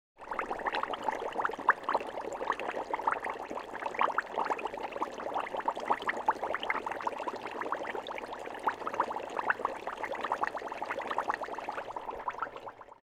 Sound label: Bubbling Cauldron